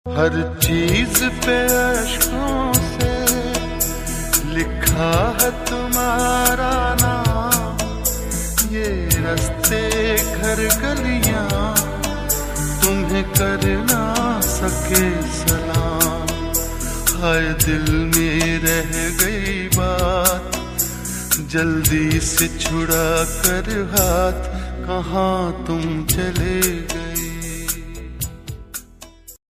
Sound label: Hindi 90s Ringtone